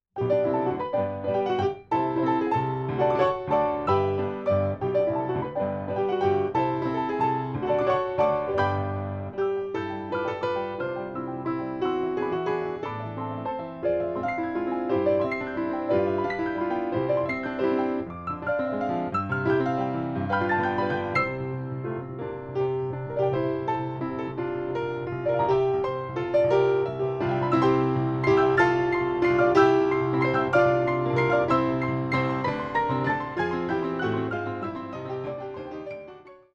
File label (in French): Valse; Marie Jael